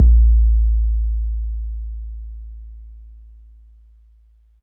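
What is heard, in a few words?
bass drum 808 MPC60 Smooth A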